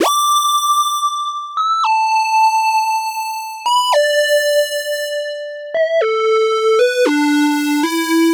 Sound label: E Phrase Square Melody; Zero-G; Future Wave